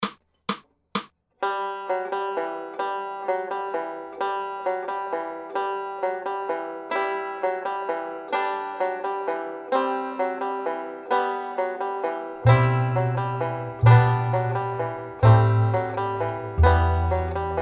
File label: Banjo; Carol of the Bells; Banjo Tablature with Play-along Audio Tracks